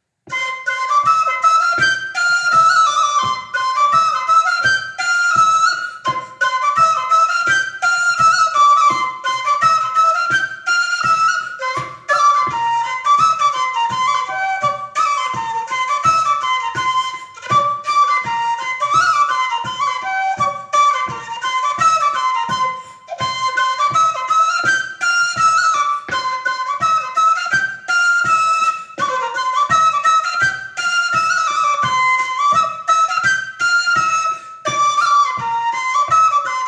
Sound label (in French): Scottisch en mode de Sol